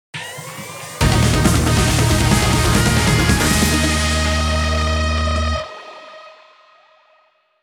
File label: Power Activation; Advance Wars 1+2 Re-Boot Camp